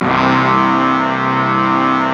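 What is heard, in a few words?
Brass Ensemble, Roland; Club-50 - Foundations Roland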